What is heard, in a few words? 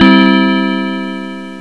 clock chime; Sound Effects for Multimedia - Volume 1